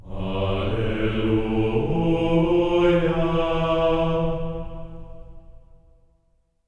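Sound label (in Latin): alleluia A3; Spectrasonics; Symphony of Voices; GR ALLELU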